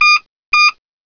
RADAR

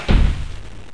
door shut

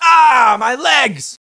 pain leg; SINDEMO

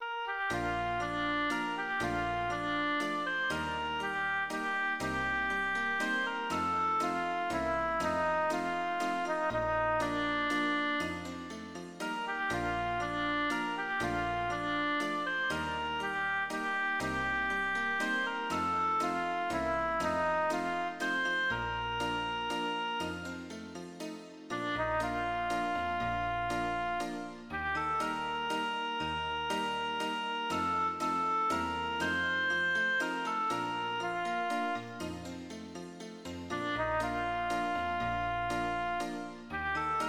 SILV BELL; Millennium Gold 2000

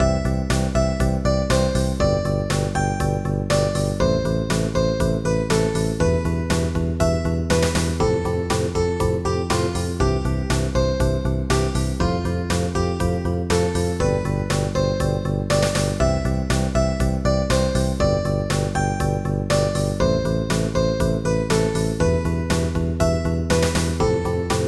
theme

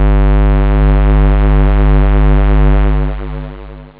floote; OctaMED SoundStudio V1

